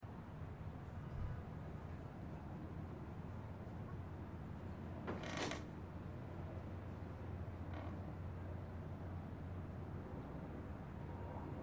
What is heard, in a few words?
Studio Ambience; udraw studio